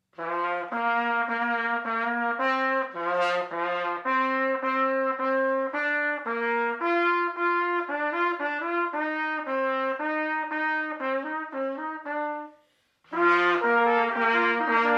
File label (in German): Trompete; Es tönen die Lieder